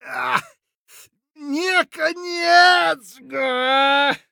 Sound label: hurt near death; Escape from Tarkov; scav6